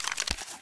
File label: beretta draw; weapons